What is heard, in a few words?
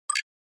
Flash Beep HF Hollow